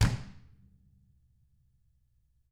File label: DW HARD, Drums